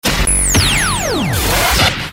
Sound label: Galery Noise; Sound Ideas - Galery Noise 001